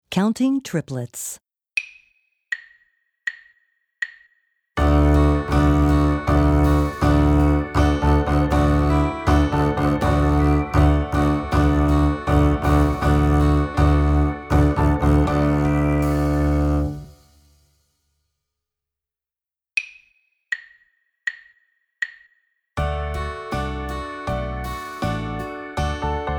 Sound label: Counting Triplets